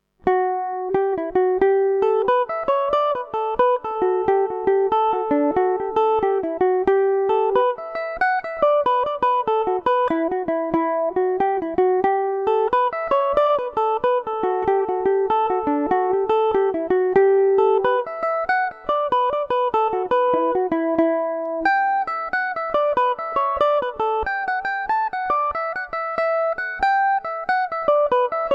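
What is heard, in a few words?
E dorian, irish mandolin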